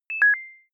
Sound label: notification message ring soft; kommunikation; signale